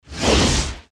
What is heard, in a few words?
agressive swoshes short